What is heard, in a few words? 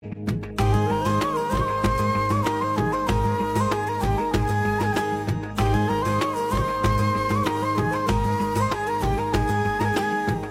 music ringtone, islamic ringtones; shan e ramazan